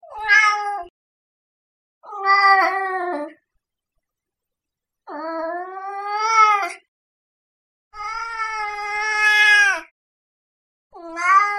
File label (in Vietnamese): tieng keu meo meo cua chu meo bi bo lai mot minh o nha